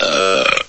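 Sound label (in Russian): отрыжка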